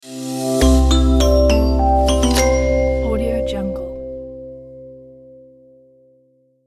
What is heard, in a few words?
marimba logo